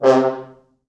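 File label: French Horns staccato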